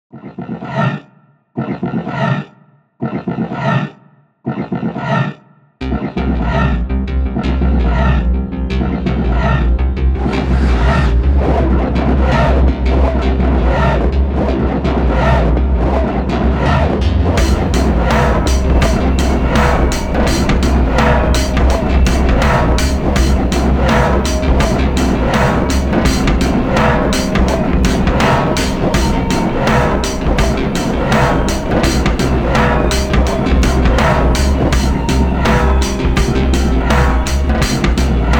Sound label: phone